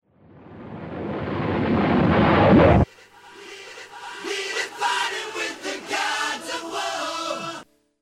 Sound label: Rocket reverse